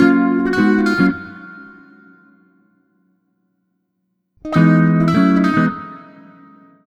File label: Multi Guitar Bossa Gtr-F#, 105; Zero-G Kocktail Kollection; Construction Kitz (Lounge)